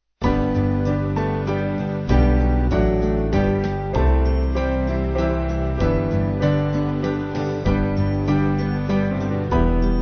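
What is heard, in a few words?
Band, Music Backing Files for Churches; Softly And Tenderly; Thompson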